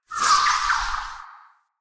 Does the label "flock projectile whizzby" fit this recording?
Yes